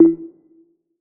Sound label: error